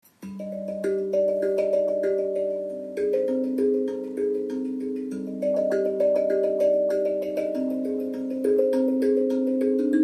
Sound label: Zenko Akebono Impro Hand